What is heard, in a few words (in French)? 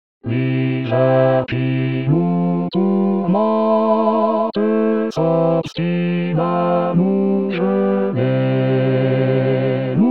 basse